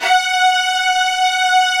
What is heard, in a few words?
STRINGS F#4